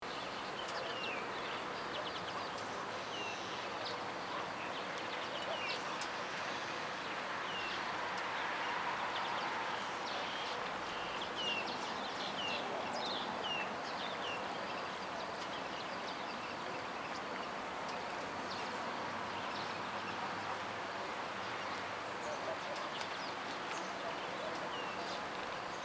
Empty playground distant traffic bird activity

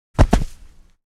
Footsteps jumping landing on sand

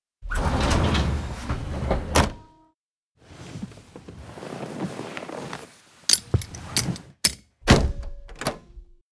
Helicopter interior entering the helicopter cabin

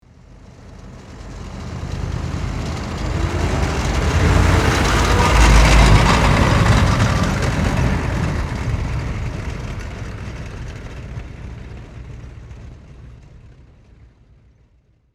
Tank passing by tracks rolling

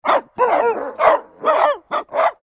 Dogs barking and whining

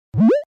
Arcade 8 bit jump

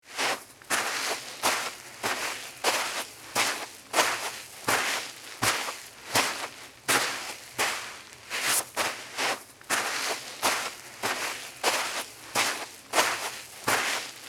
Footsteps walking on sand with pebbles barefoot

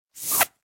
Sauce bottle empty squeeze squirt air; cooking

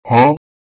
Huh cat meme

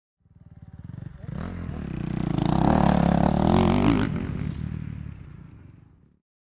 Motocross fast passing by close perspective

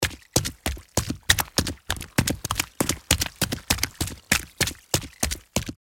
Footsteps on wet street shoes run

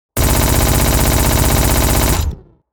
Sci-fi weapon machine gun automatic bursts

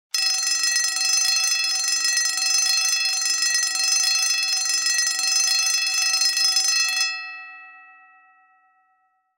Fire alarm bell emergency steady ring long

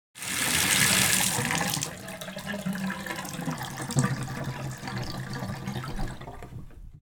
Bathtub water draining

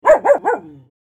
Medium sized dog bark

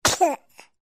Infant cute sneeze

